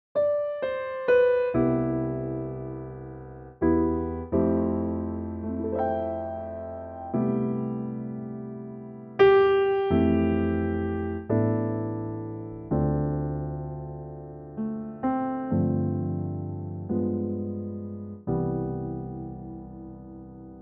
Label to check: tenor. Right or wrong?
Wrong